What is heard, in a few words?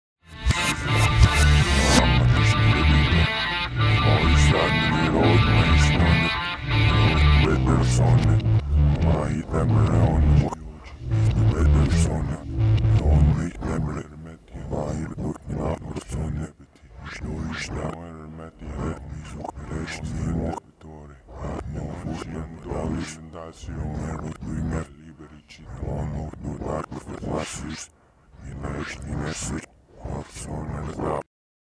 satanic debris reverse; backmasking contrario